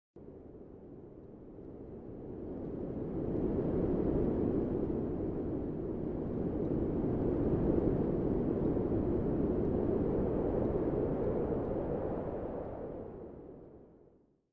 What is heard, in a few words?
windmill; آسیاب بادی